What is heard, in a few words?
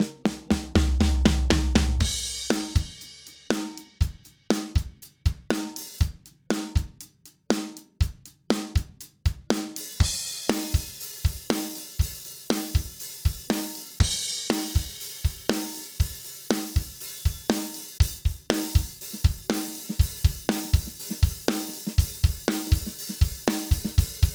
acoustic drum loops; presonus